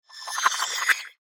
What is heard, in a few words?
Laser Shuriken; Laser Sound